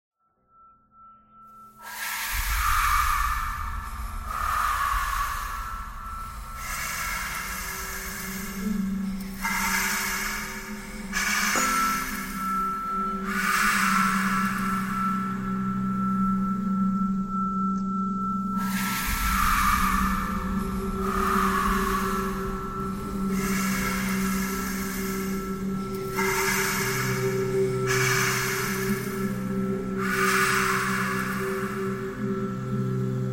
breathing thread noise

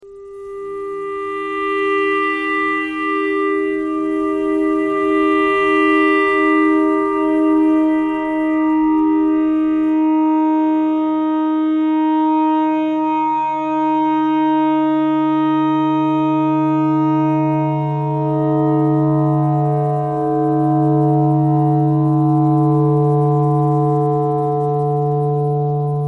clarinet notes e clarinet thread noise

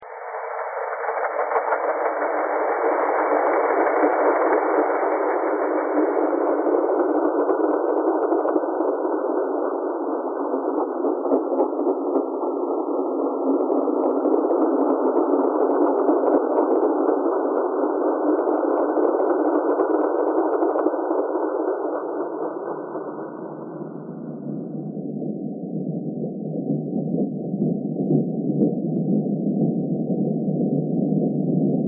thread noise

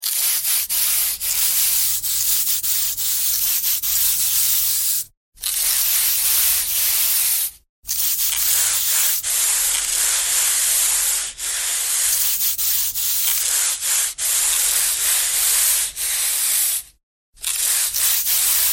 scraping scraping thread noise

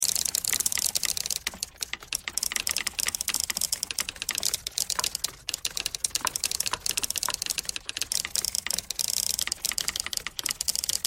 typewriter thread all

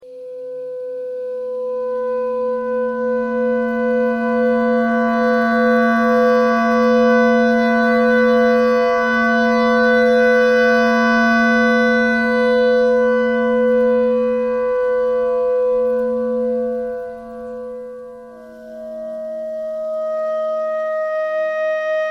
clarinet clarinet notes e noise

